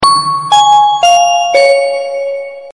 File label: Airport Tune